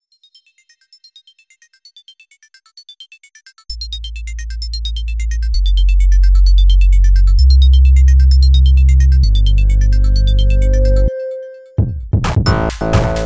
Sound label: poly fm, polyend tracker dance